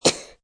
Cough Kid Single, Cough, child, single sick, cold, (human voice)